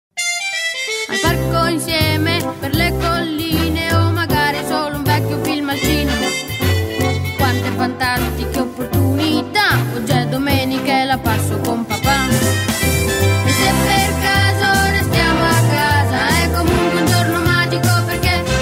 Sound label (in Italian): SIGLE TELEVISIVE